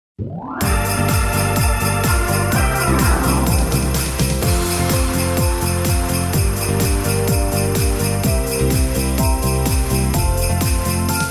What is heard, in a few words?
christmas vacation rhythm track with bells, square dance